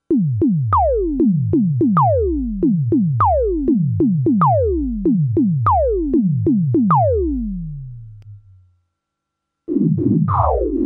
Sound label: GATE REVERB